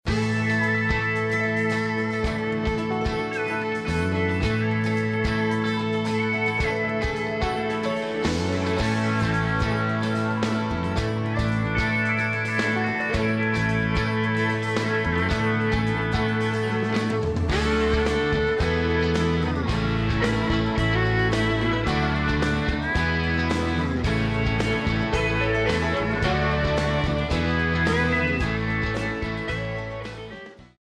country

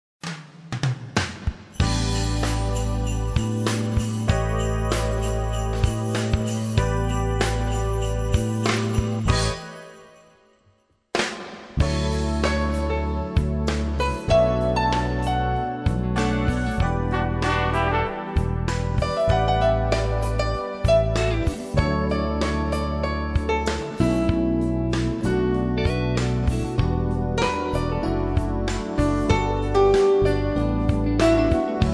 holiday toy band

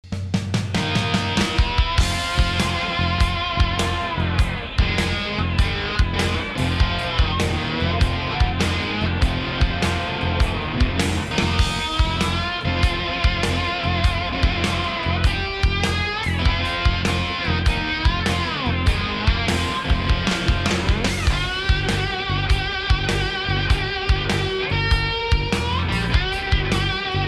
hard rock

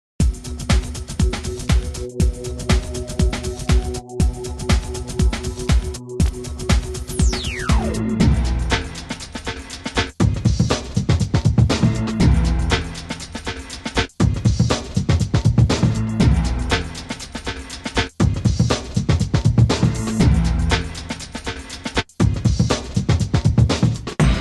contemporary urban pop

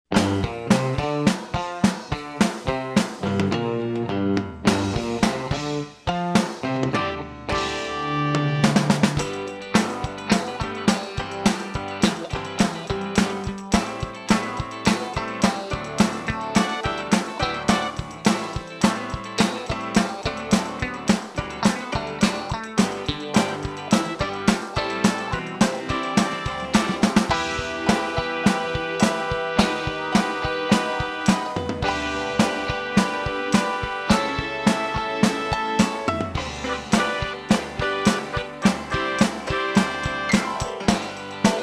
Light Jazz Smooth Motown

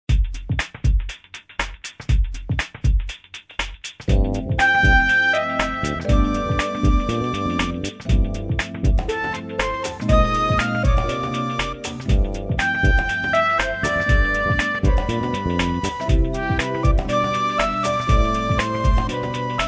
light jazz

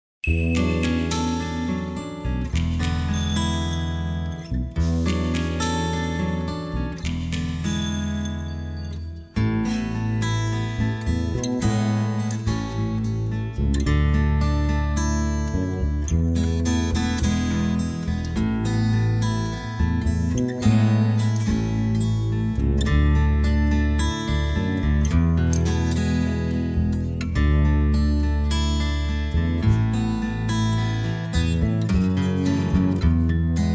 atmosphere

